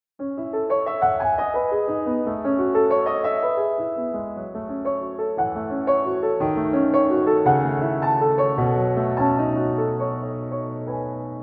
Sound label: Debussy Arabesque, Piano